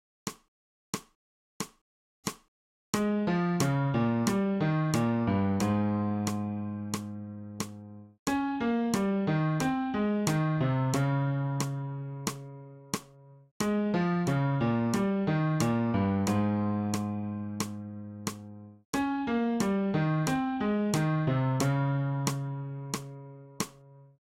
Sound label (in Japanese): 新フレットレス